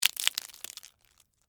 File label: Texture, Debris, Waffle, Cones; Debris (Sound Effects:Materials)